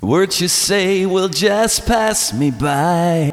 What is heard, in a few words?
free vocal samples